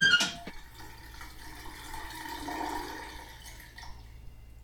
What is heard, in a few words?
Shower off; Phasmophobia